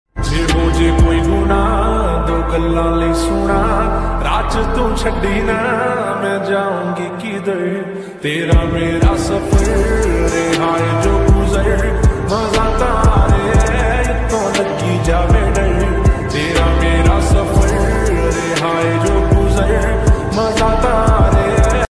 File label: song ringtone slowed reverb ringtone punjabi attitude song ringtone